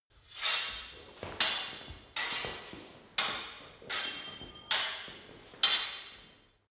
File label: sword hit sword sequence long